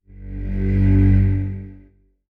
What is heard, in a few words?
pulsing tone